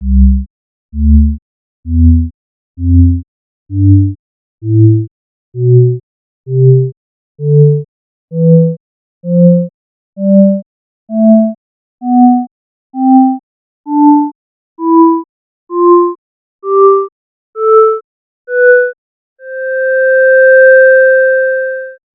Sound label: flute